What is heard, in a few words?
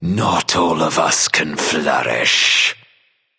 death; smite; ahpuch